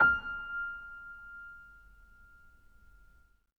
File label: Acoustic Piano, piano stereo; InVision Interactive; Miroslav Vitous